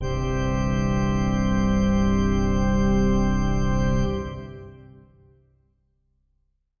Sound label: Heavy Pipes C, heavy pipe organ; SYNTH KEYS